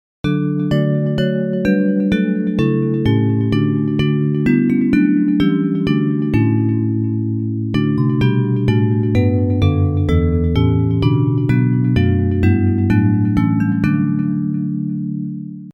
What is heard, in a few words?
Bells; Where Charity and Love Prevail; Hymns